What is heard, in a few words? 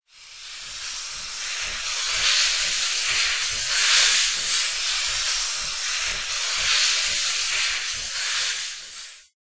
smoke